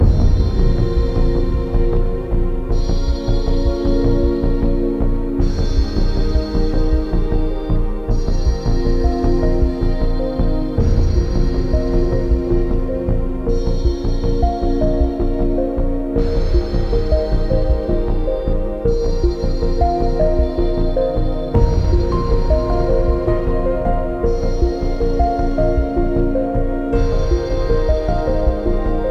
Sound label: BGM dungeon Last